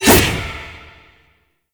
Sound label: Void Tonfa Whoosh, melee weapons; Warframe; Zariman Tonfa; Void Mode